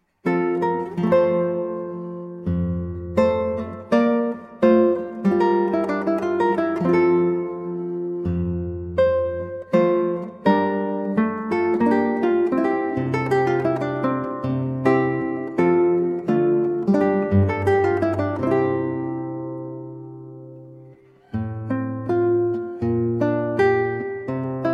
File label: 8 String Guitar; Come Away (Come Again); Dowland; John Dowland